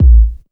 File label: KICK deep, Techno Trance DRUMS